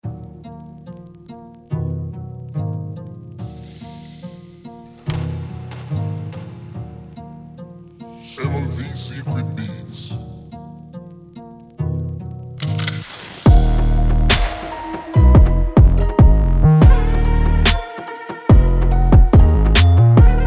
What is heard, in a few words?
Drill